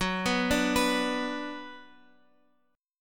F sharp Suspended 4th Sharp 5th, Guitar, Open F; arpeggiated; F#sus4#5

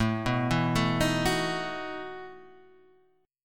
A 6th Suspended 2nd Sharp 11th, guitar; A6sus2#11 Chord {5 2 2 2 4 2} arpeggiated

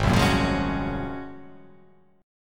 Ab 13th Ab down, guitar, Ab13 chord; strummed; JGuitar

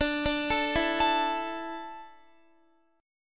D Suspended 2nd D, down, Guitar; arpeggiated; 4F,4A,5C,5G,5C,6E

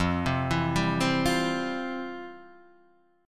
F Major 7th Suspended 2nd, Guitar, Drop D; 3,3,2,0,1,1; down; chord shape

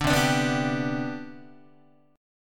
C Major 7th Suspended 2nd C down Guitar, chord; CM7sus2 strummed; 5,8,5,9,6,6; JGuitar

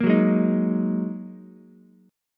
G sharp 7th Suspended 2nd G sharp, down, Ukulele; strummed; chord

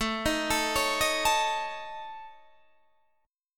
A Major Flat 5th, Guitar DADGAD; chord

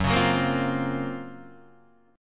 F sharp Major Sharp 11th, Guitar, Standard; F#M#11 strummed

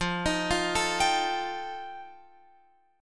F 9th F Guitar Open F, chord; arpeggiated

